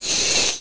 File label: crawler idle